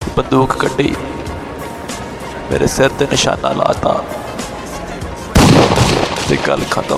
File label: Punjabi Remix Tones